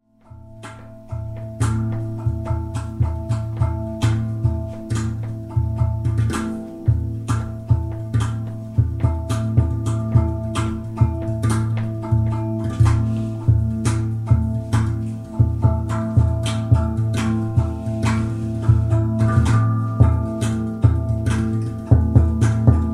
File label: handpan pur